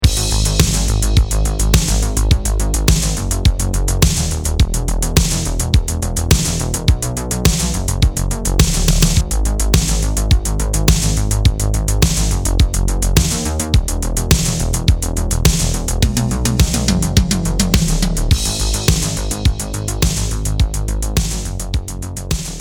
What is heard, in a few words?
80s Synthwave DRY; DDD Bus